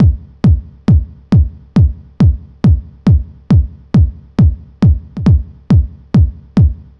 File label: Kick, Club Techno, 137 BPM; Zero-G; Construction Kits